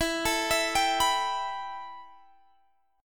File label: A 7th Suspended 2nd E